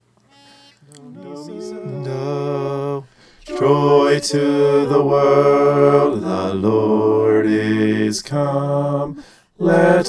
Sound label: bass